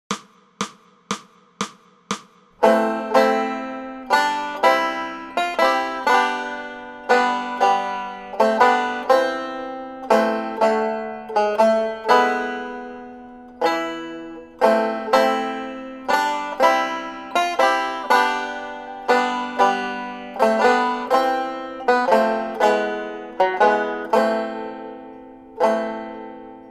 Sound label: Banjo; What Child is This?; Banjo Tablature with Play-along Audio Tracks